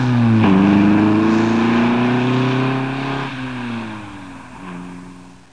car start; Presenting OMO

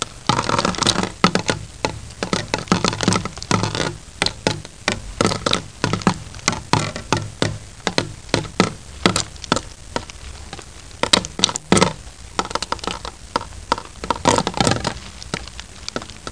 Water Drips

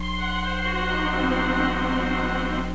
ominous

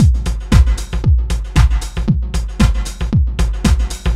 Electronic Drum, Loops

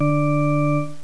PIPE

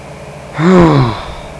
relief; People; Sound Library 2000; Wayzata Technology

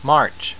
march

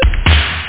dbl drum; 17 Bit Software 2: Collection B

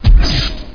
fires

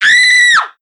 Scream Female

